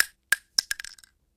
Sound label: shotgun shell